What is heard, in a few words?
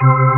Full Fast C4; SoundFont - The Developer's Choice - Volume 1; b3fast